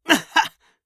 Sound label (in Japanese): がはっ！！, 戦闘ボイス（青年・中音）